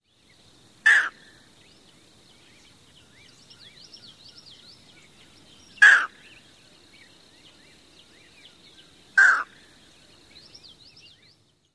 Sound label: skow! calls